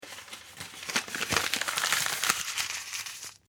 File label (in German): office papier knuellen